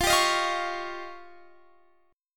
F 7th Suspended 2nd Sharp 5th

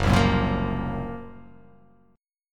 Gb Major 7th Flat 5th, GbM7b5 chord; strummed; JGuitar

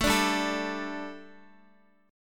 G Suspended 2nd G, down, Guitar; Gsus2; strummed

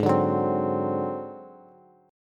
G sharp 7th Flat 5th, Bass; strummed; chord; JGuitar

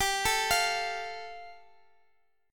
G 7th Suspended 2nd, G, Guitar, DADGAD; down

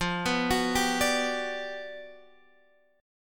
G Major 7th, F; arpeggiated; JGuitar

